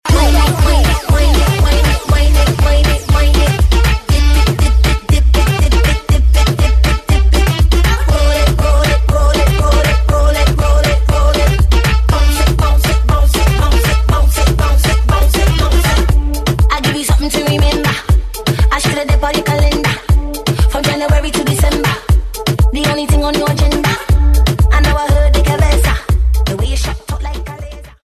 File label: Big Drum Beat